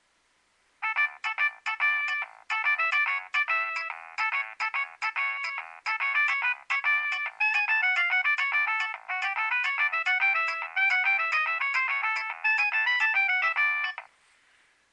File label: nokia3100 ringtone